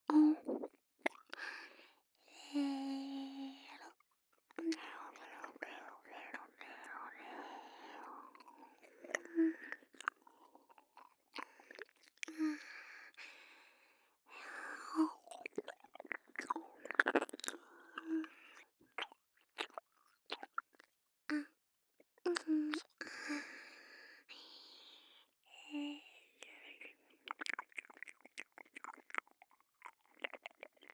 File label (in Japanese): 右耳舐め, ASMR; アドリブ集